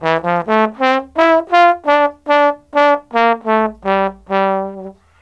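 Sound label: TROMBONE SOLO, BRASS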